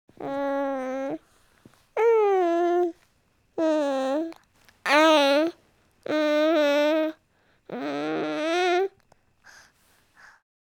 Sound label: baby moaning with p; Asian Show Sound Effects